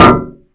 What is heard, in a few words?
metal impact, Bullet Impact; Dystopia; physics